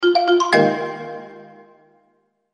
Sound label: Special I Phone Alert